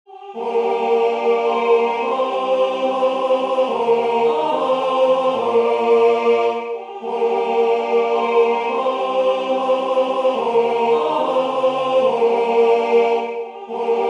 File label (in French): Jai vu des fleuves deau vive tenor